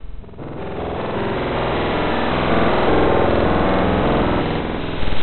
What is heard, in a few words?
8 Bit Noise